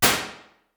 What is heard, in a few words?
Gym Shower, Medium Rooms, IR Samples; Native Instruments; Battery 3